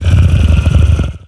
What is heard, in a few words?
alien idle; xenomorphs